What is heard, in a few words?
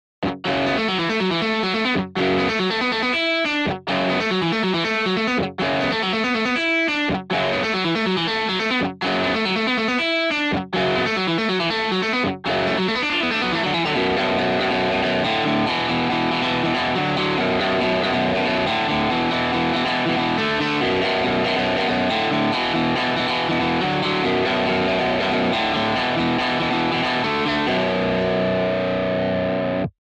telecaster